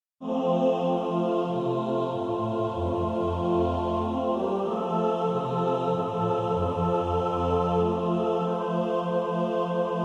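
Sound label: mixed, practice music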